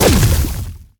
rage outburst impact; heroes kratoa

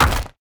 hero stones drop; sfx

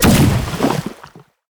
tower brew master bad batch explosion

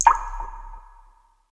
ambience underground

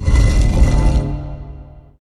boss totem

